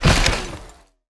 car robot fall

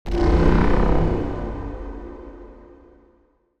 ui poison fog

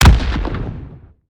skill radio call post

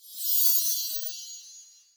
magic shimmer

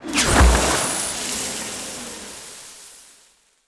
skill attack warning

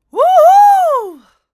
ape

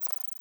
ui infoline

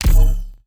ui confirm

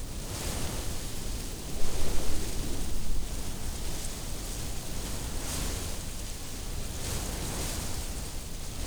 reed marshes

